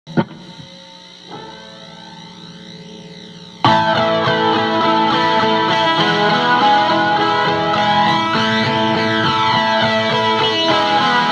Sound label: Electric Interlude